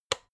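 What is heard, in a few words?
electric whisk turn on single, kitchen; haus-garten; kueche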